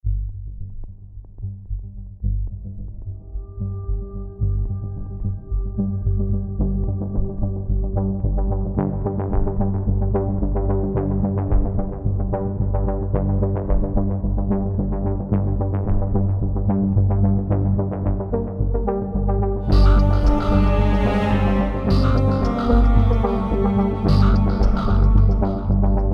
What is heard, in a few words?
Jetpack